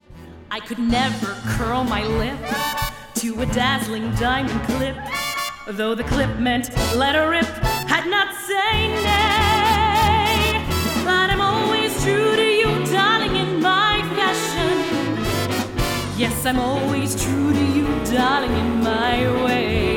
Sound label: Musical Theatre Singing